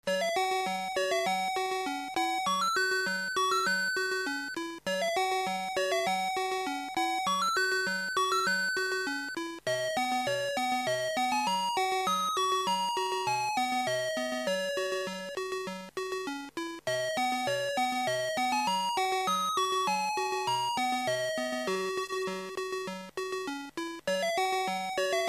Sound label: Tune B; Loopz